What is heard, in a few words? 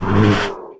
moan; The Elder Scrolls III - Morrowind